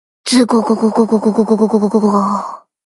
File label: water